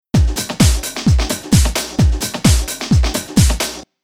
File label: Rhythm Loop